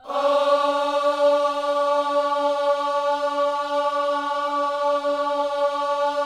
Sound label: OHS, gospel vocal; ILIO; Vocal Planet